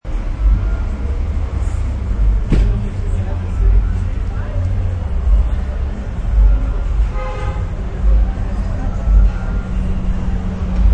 Brklynw Bss, Busy city street with sub-bass from car.; Bumpin' City Bass